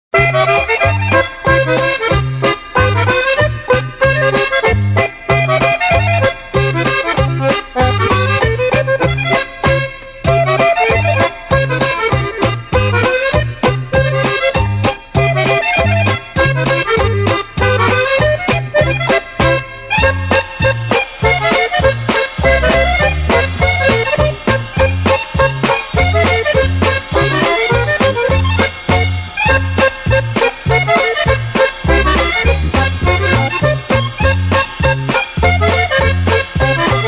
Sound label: Blue Bell Schottische, accordions